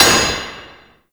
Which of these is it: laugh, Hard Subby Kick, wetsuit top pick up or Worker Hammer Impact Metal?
Worker Hammer Impact Metal